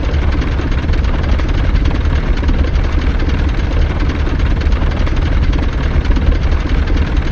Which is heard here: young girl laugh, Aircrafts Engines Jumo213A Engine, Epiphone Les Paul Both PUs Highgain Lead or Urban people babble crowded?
Aircrafts Engines Jumo213A Engine